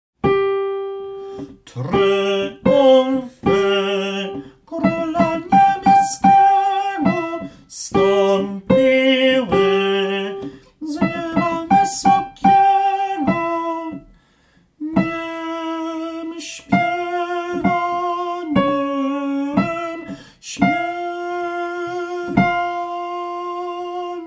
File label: TENOR